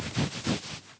Cloth

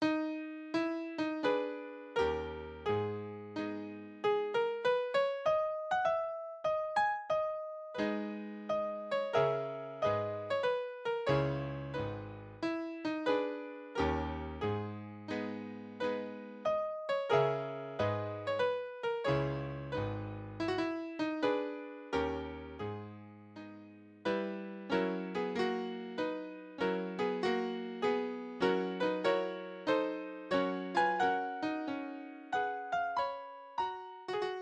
MIDI BGM; Carmen Sandiego Junior Detective Edition; Europe2

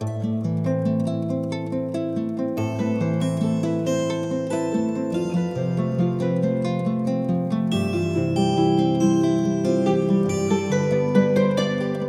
Music Resurrection Stringed; 7 Mages

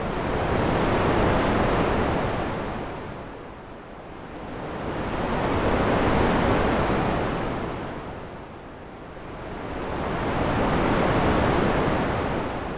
Waves; Cruelty Squad

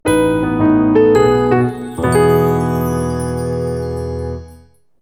Story Jingle; Love Live SIF2 Miracle Live; android ios

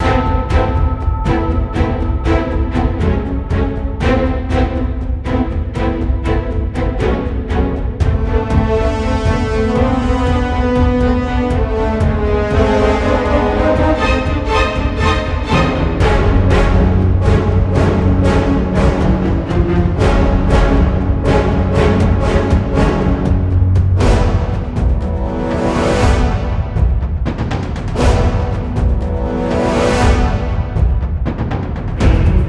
Battle Theme Hard Clash Loop, RPG Fantasy Music; Sofiya and the Ancient Clan; PS4, PS5, Switch; RPG Fantasy Music Pack 02

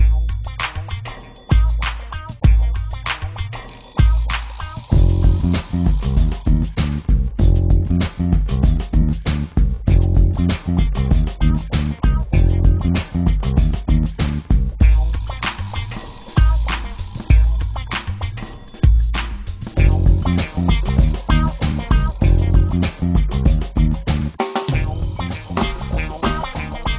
Hip Hop; Talking Roby the Robot

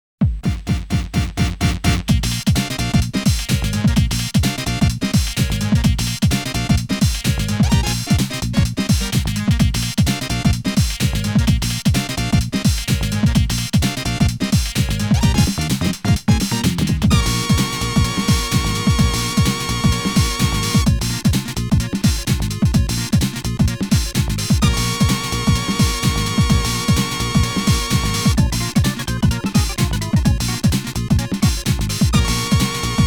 Ready Funk

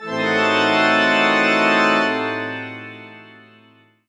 Ghost; Kirby's Extra Epic Yarn; 3DS

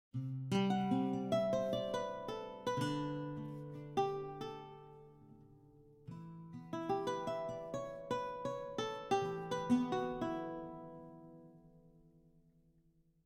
baroque guitar; Quivr; PS4 Windows gamerip 2016

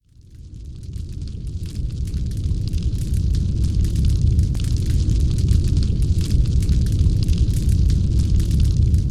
Ambience Fire Full

